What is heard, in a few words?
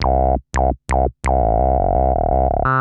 303 170 C, bass; musicradar; bass boutique